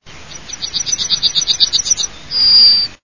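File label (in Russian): ovsjanka, bunting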